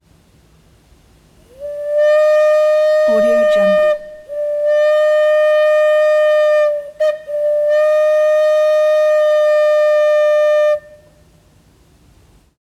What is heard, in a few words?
steam train whistle long bursts; prosoundfx